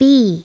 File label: BEE; SoftKey; WordBank